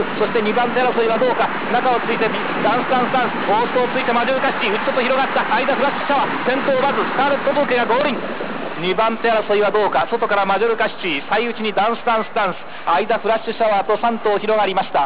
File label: goal